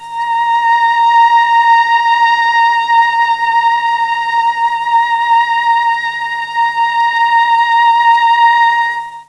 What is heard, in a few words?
A# 300 VIOLA, Mellotron, STRINGS; Mike Pinder - Mellotron Archive CD